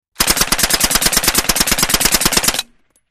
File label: Metallic Silenced, silenced